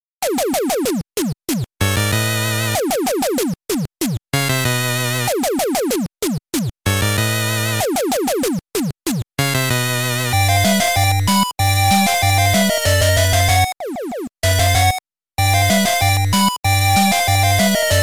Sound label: 8bit GAG